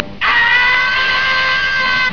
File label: scream